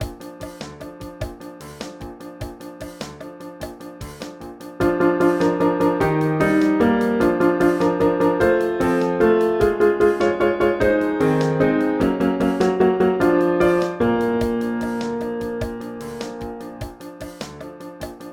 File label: ACCOMP